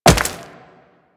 Wall Jump Rock; Footsteps; Warframe; Tenno; Wall Run

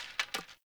Hunter Bow Ranged Equip End; Weapons; Player; Bows; Envoy Hunter Bow Ranged Melee

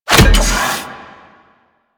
RPG Knockback Metal Impact; Hellion Elite

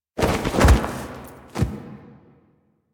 Fall; Warframe; Quests; The New War; Drifter; Foley

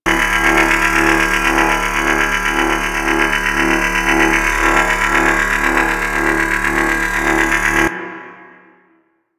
Split Laser Beam Loop; Warframe; Corpus

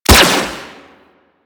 Dispersion Laser Fire; Weapons; Warframe; Corpus; Riot Moa Laser

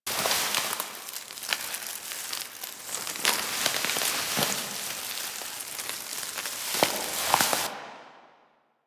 Foliage Movement Grass Run; Warframe; Tenno; Foley

